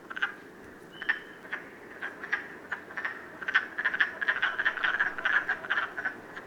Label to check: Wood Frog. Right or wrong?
Right